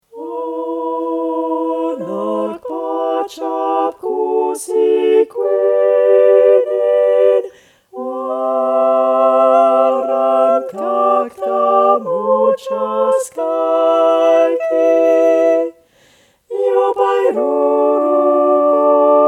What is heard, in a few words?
hanacpachap tutti; Hanacpachap Cussi Cuinin